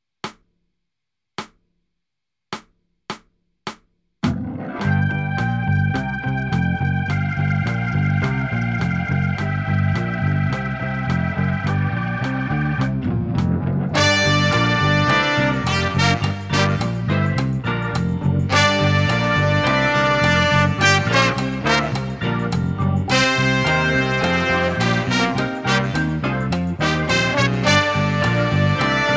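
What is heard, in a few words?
Backing with click, Concert Backing Tracks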